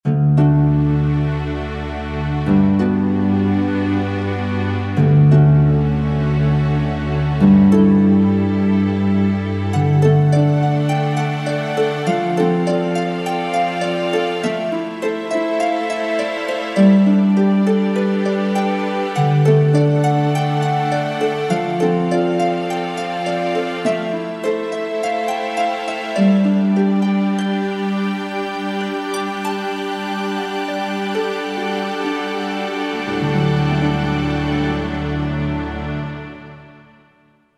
Harp & Strings, Korg TR-Rack

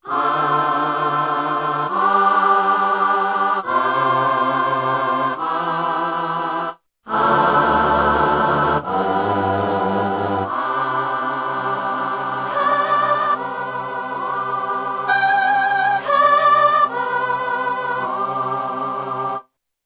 Pops Voice Ahh, Yamaha TX16W

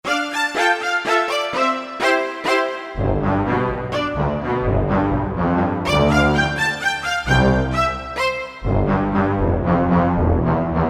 Low-Spicat, Korg MSC-1S Variety Combinations

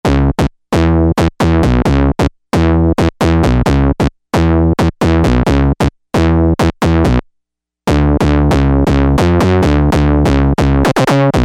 Stabby Bass

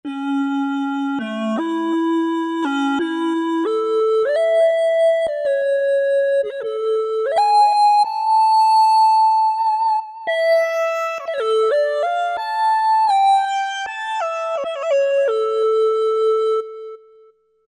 Lead, Roland V-Synth; Factory Patches; 207 Shuu Lead

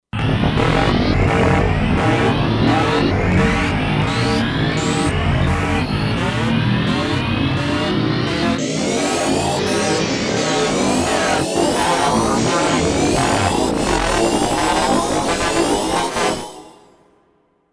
Huge Machine

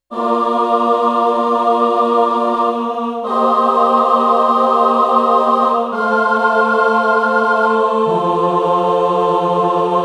Choir

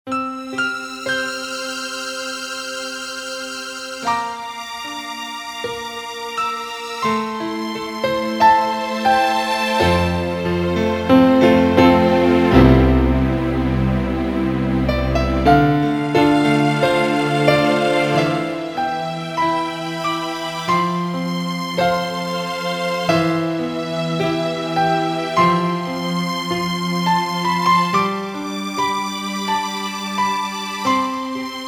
Casio CTK-601, Tones, Pno Str

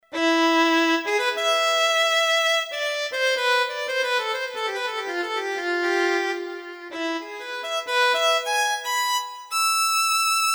Solo Violin

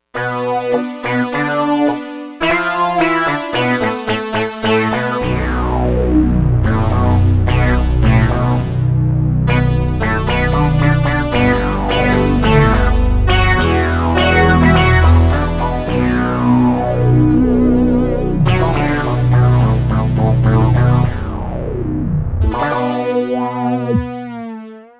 36 Moogs; Digital Synthesizer

